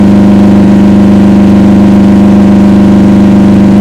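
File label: camaro on low,car engine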